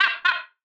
bird caw

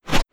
ax throw; insurrectionist

